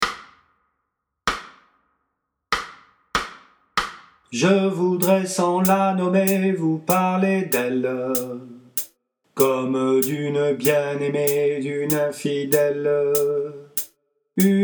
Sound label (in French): Alti, chorale